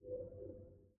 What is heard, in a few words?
gallery Switch; starwars; episode vii the force awakens